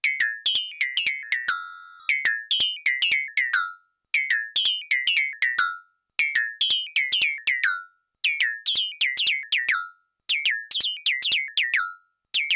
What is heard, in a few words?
Glass Bottle Engine; MODOR DR 2